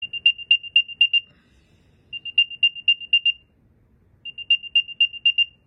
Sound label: AirTag notification sound